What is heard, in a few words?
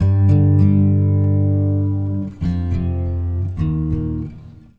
Guitar Only; Sonic Foundry (Sony Creative Software); Friendly Fire